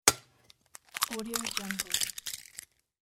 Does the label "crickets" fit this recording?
No